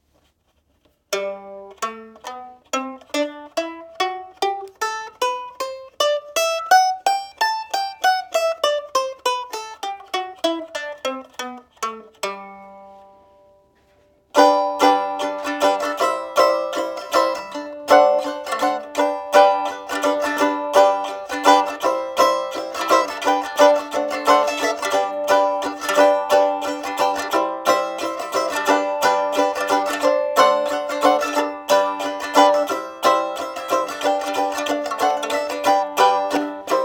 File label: Banjolele